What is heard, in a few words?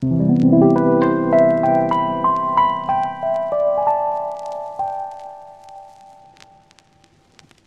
Bell vinyl